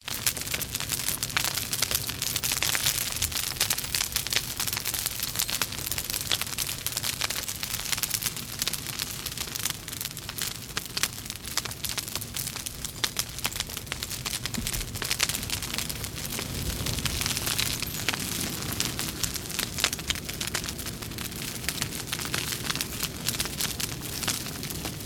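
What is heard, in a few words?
fire crackling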